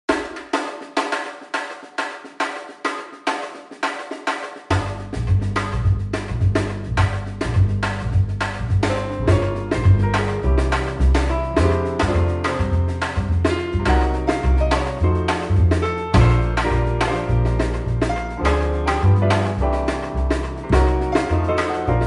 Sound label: bossa nova